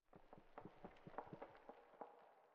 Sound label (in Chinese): 苍白垂悬苔藓声